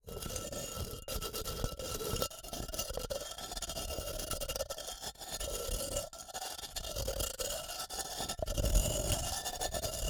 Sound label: Jar, terracotta, ceramic, lid, rubbing, scraping, grating, rough, ragged, very coarse; Eiravaein Works; Vaeyan IV